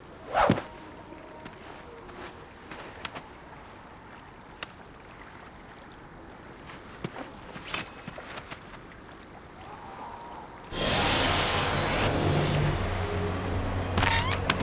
Bubble; giants

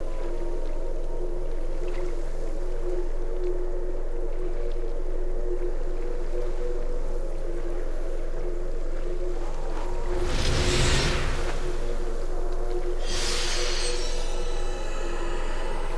Turbo; giants

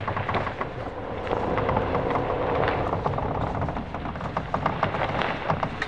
jetski; giants

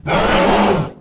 roar, offspring; giants

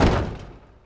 bump, jetski; giants

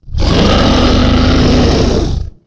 ROAR; kabuto; giants

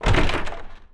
enter; permanentaliens; giants

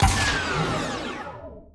jetski; giants

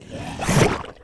spawn, ripper; giants

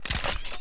ammo; permanentaliens; giants

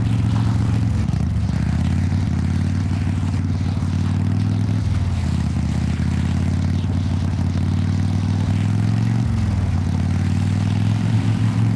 jetski rpm; giants

